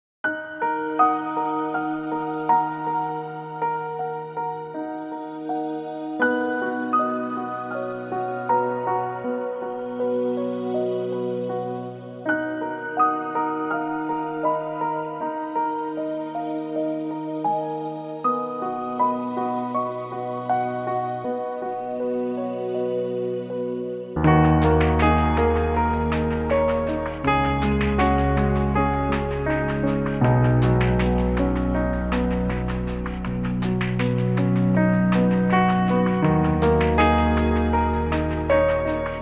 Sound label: piano magic; Awezoom